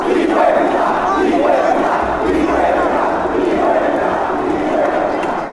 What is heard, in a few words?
deportivo cali chant